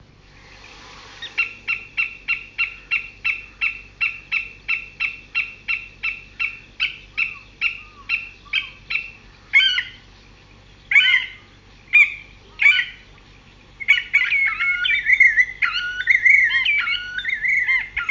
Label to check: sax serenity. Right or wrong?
Wrong